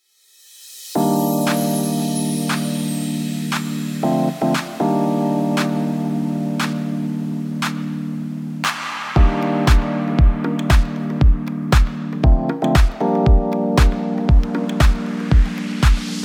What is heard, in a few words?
chill lounge, ringtone; MIUI12